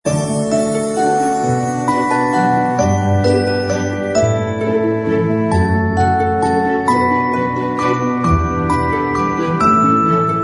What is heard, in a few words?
sweet